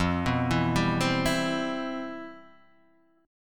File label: C Major 7th, F, guitar chord; Cmaj7/F arpeggiated; JGuitar